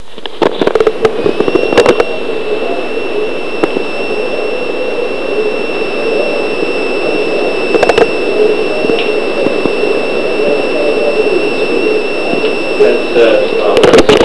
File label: static